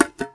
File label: Foley Paint Tin Perc; essential drumkit